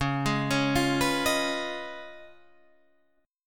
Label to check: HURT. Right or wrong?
Wrong